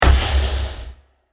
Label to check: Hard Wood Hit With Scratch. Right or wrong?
Right